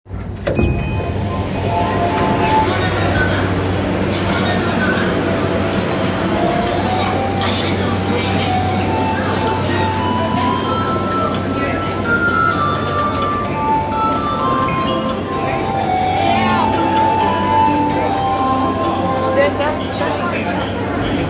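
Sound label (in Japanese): 山手線 高田馬場 counterclockwise, train sounds; JR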